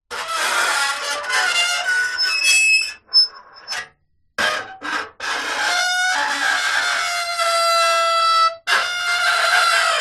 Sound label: foley metal scrape on metal screech; zapsplat